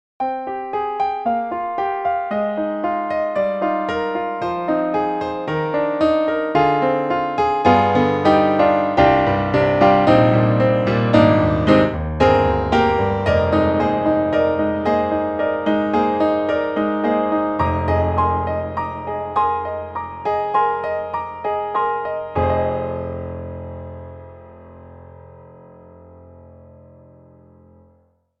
Roland INTEGRA-7 Upright Piano (SuperNATURAL Acoustic Tone)